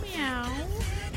meow

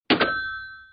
hangs up the phone

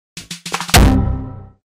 error; fortnite

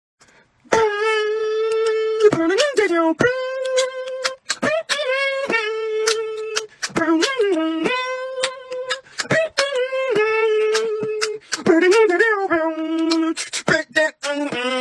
beatbox

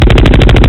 gun shooting sound

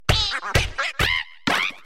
tweet birds

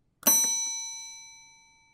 bell 4th min

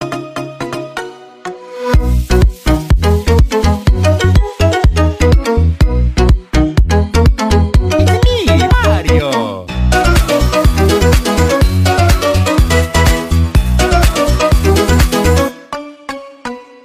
electro remix

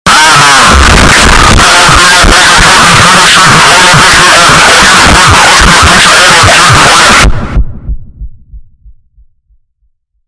distortion

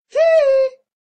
teehee sound fx

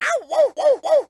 burning sfx; luigi

